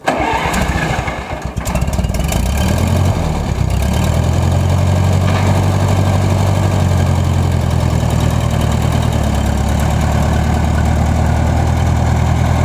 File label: Tractor, antique tractor